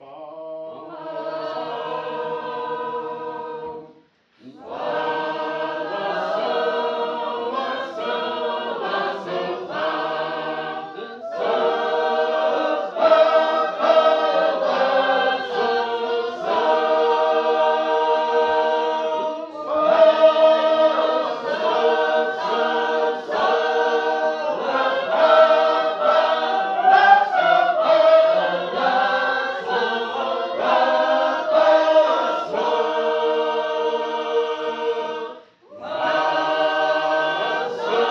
The Gospel Pool, sacred harp

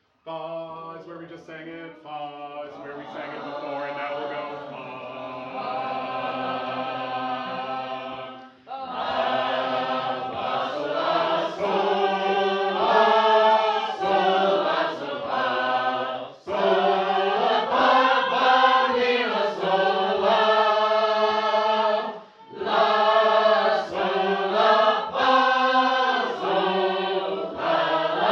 Liverpool keyed low, sacred harp

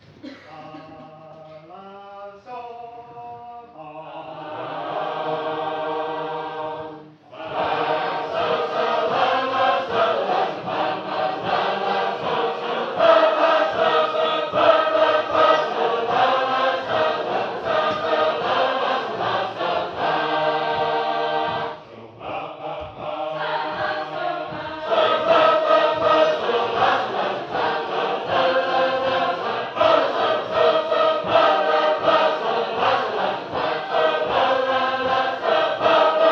Wootten, sacred harp

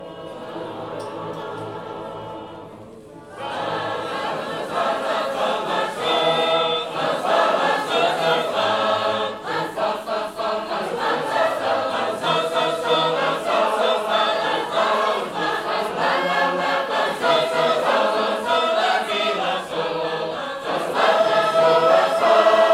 Sacred Harp; A Thankful Heart

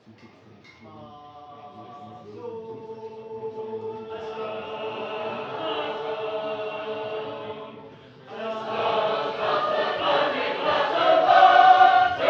Bear Creek, sacred harp

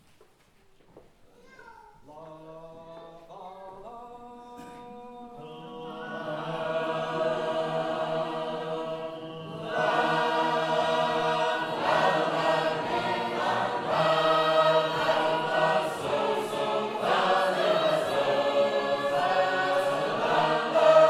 small group, sacred harp; Carmel

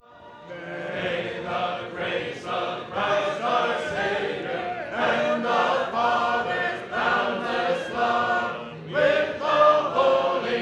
Columbiana, sacred harp

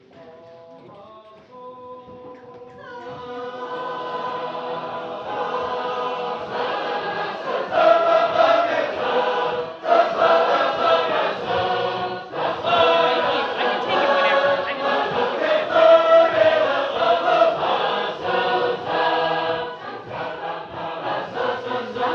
Ocean, sacred harp

